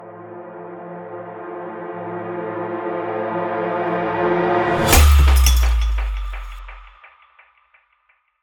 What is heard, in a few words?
Trailer Hit